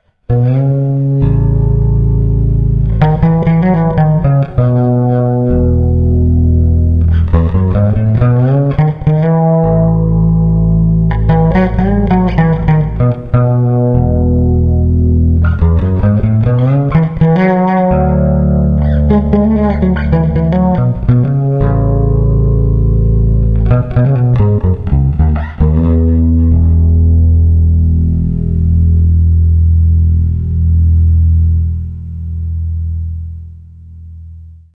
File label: fretless, jazz bass